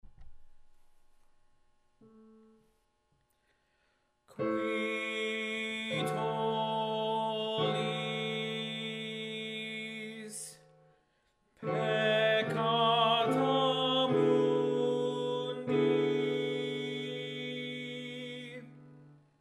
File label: Qui Tollis Tenor; Choral Resources